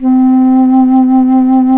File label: bass flute, vib; Roland L-CDX-03 Disk 1